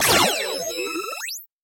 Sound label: Laser Sweeper